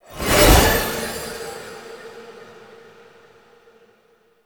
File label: Horse Appear; Vehicles; Foley Actions; Warframe; Duviri